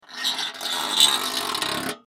Scrape Metal Grind, Foley Scrape Metal Grind Squeak; Materials; Blastwave